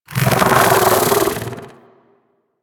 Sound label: bug vocal alerted; Warframe; Hunting Critters; Inf K Drive Bug